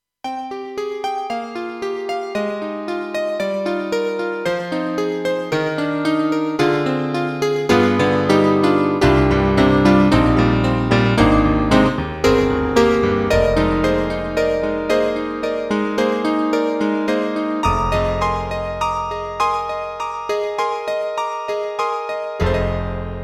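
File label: Electric Grand; KAWAI; GMega LX; ElGrand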